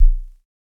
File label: Subbass, Univox SR-95